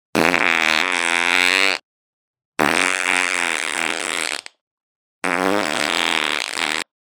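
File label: fart sound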